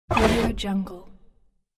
wooden slide; fxprosound

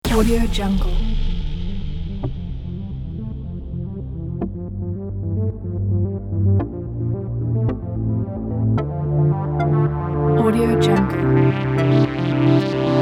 energy dubstep; audiohawk

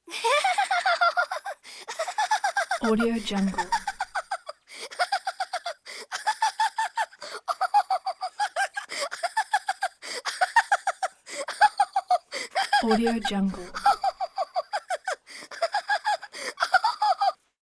childs laughter

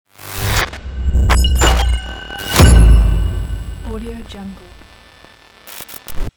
glitch logo

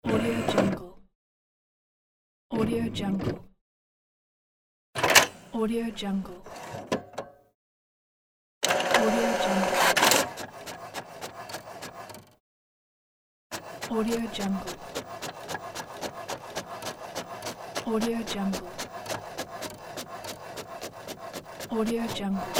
printer and paper foley

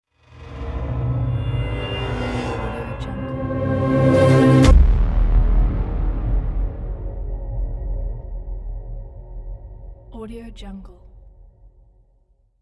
horror transitions logo

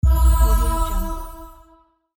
heal

